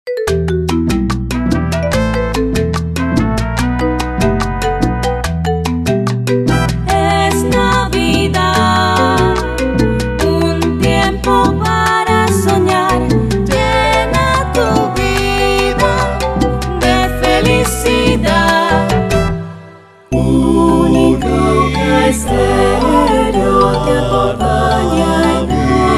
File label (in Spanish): jingle navidad; UNICAUCA